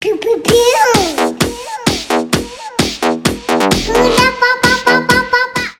Piu piu piu na SMS, Rington

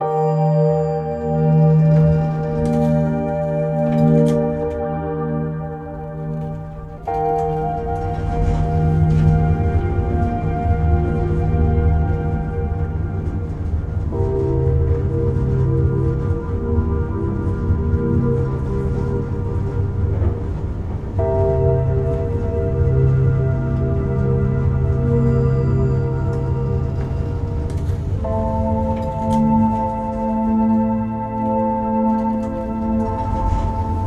Zvuki goroda ASMR

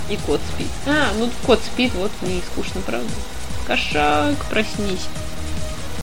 kot spit

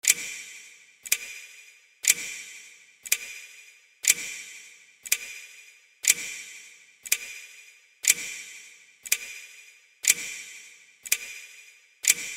Zvuk, Tikane chasov

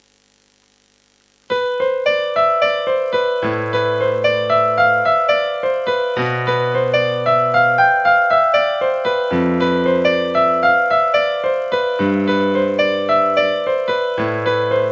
Pianinko

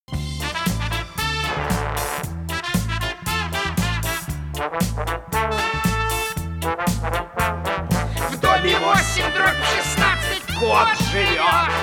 Instrumentalnyj ansambl

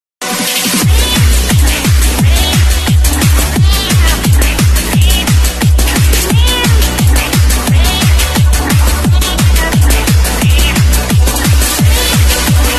evrobit remiks

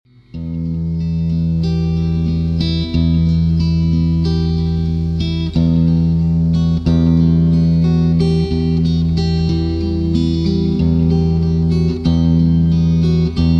Gitara Kaver

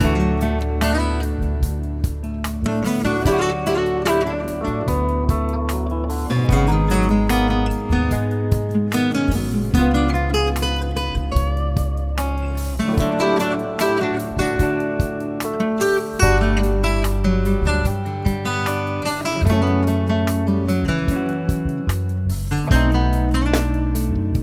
Rasslablyayucshaya gitara

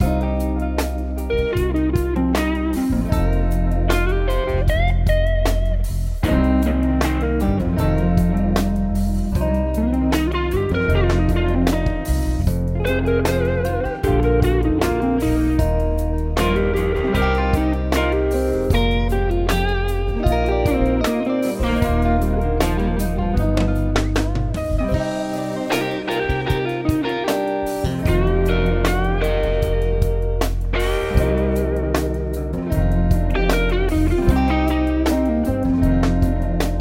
Rasslablyayucshaya gitara

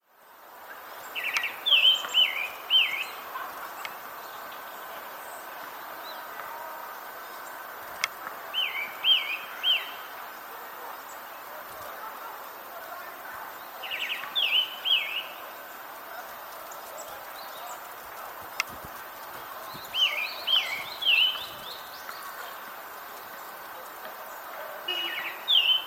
Blednyj drozd Turdus pallidus; Grant Fisher

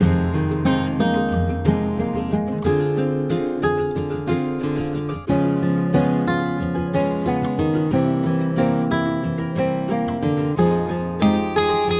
Gitara muzyka

